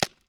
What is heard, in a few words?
vm empty from side, guns; vm-68